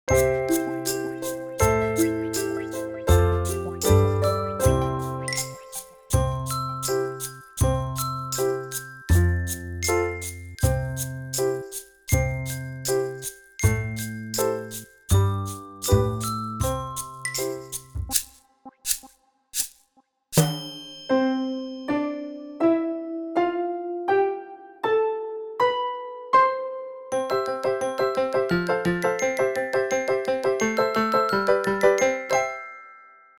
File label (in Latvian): burti metalafoni